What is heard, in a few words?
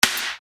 Snare Dr